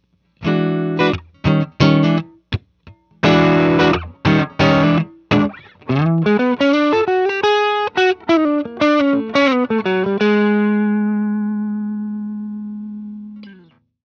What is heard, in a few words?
LOW GAIN TS9